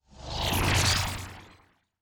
Teleport, Sci-Fi Sounds

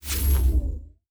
Shield Device Stop, Electric; Sci-Fi Sounds

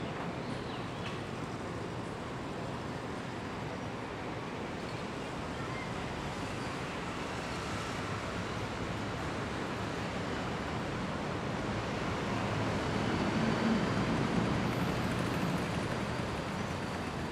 City Loop, Sci-Fi Sounds Hum and Ambience

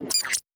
Device Stop, Electric; Sci-Fi Sounds

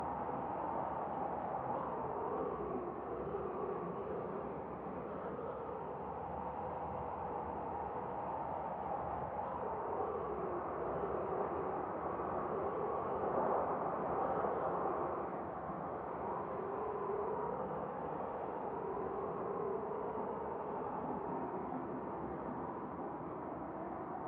Wind Loop

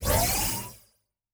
Door, Sci-Fi Sounds

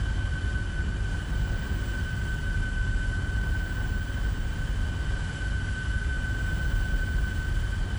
engine loop, sci-fi sounds, mechanical

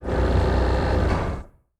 servo big, sci-fi sounds, mechanical

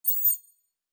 Digital Click, Sci-Fi Sounds Interface

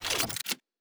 Weapon Reload (Laser); Sci-Fi Sounds

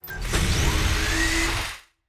Door, Sci-Fi Sounds

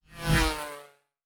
Fly By, Sci-Fi Sounds Movement

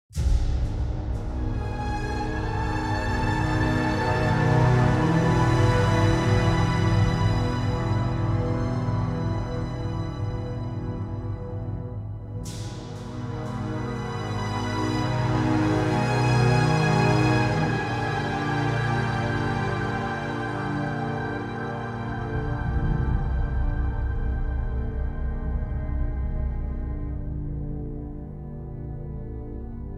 Opening, Classic Horror Sci-Fi Music; Dead Ark

